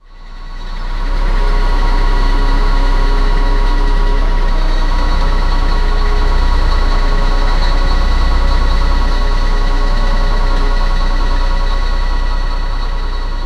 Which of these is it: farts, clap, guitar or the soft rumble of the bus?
the soft rumble of the bus